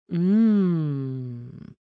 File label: Moan Pleasure Female